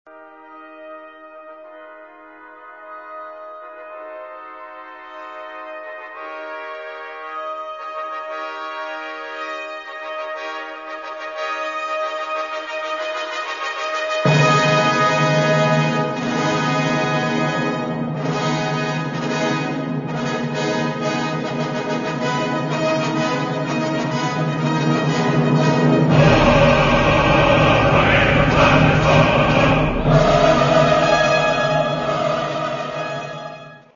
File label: tuba; verdi